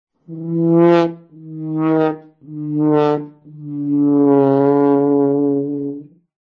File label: trombone